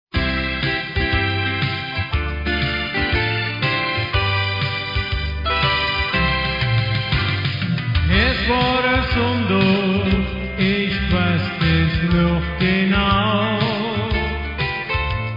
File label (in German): Drehorgl